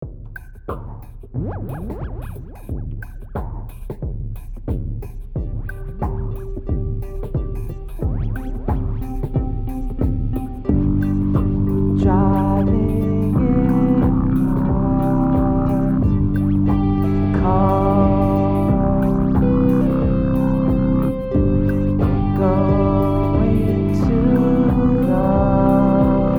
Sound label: chillwave; 2011: a joke song made in the "chillwave" style as best as I could